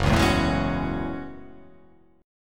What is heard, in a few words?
F sharp Minor 9th F sharp